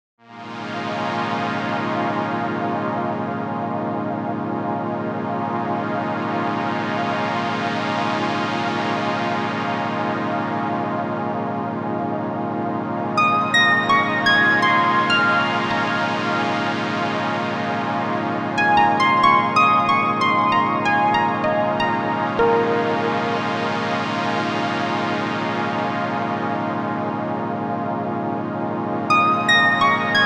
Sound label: Solina Split with Plucked CS